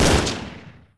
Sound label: oicw fire, weapons